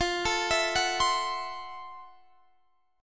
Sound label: F Major 7th Flat 9th, F, guitar; arpeggiated; JGuitar